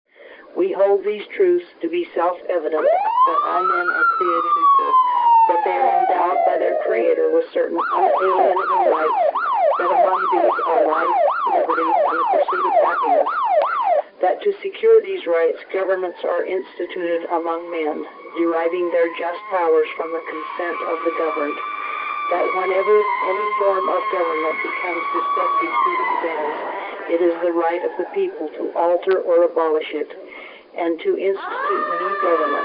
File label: combined declaration various noises